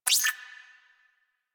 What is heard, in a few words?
Mining Laser Sweet Spot; Weapons; Warframe; Eidolon Mining Lasers